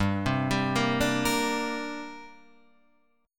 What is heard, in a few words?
C 7th Suspended 2nd